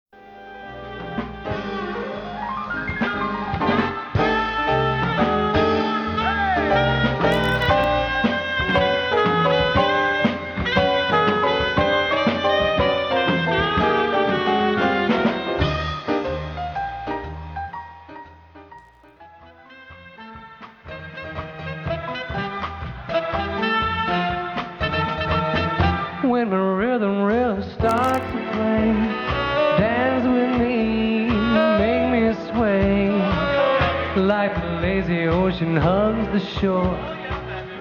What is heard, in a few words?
drum